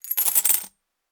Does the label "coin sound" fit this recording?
Yes